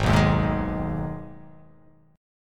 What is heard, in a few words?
G sharp Minor G sharp down, chord